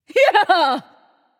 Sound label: "yay"; smite; nuwa